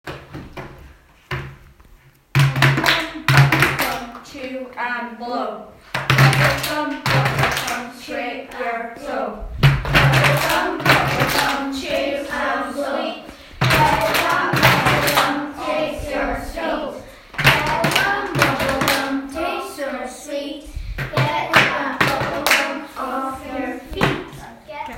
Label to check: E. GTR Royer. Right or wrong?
Wrong